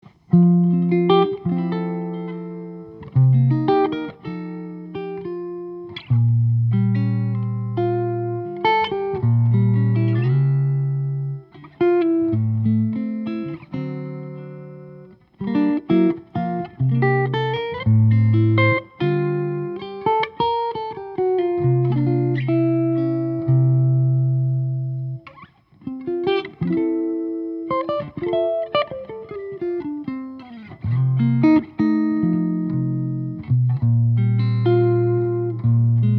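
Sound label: Archtop with amp, electric archtop guitar; newport; maple; spruce; Greenfield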